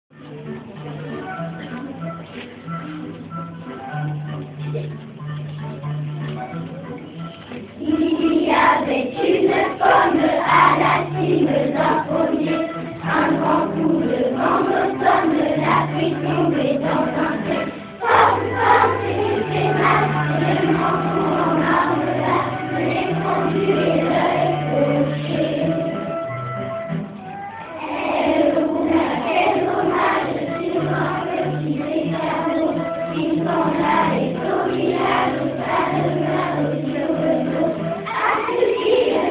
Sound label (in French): chant, chorale; chorale kermesse juin 2013